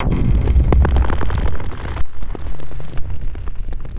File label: Rockslide